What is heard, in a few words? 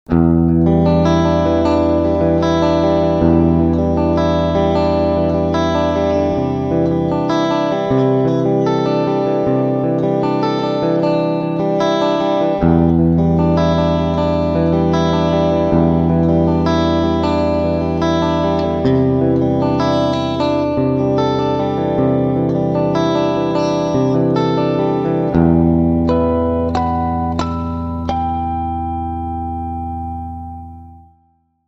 Single Coil clean picking